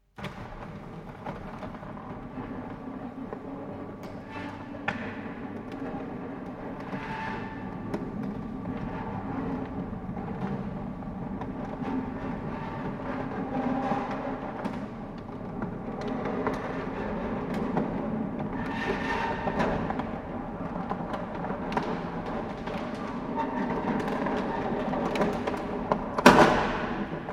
Industrial Mechanical Electric Door Large Mid Perspective Closed